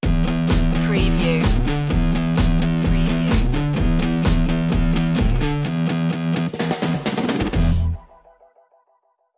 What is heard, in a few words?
street grind stinger